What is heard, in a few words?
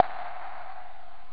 Button; 特酷声音素材库; 按钮